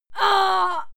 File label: female fight scream pain